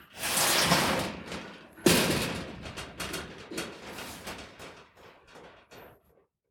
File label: Long Metal Scrape